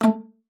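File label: A3, bass flute; Xsample VOL-2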